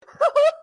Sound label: hehe